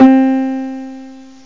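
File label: acoustic bass; gmplay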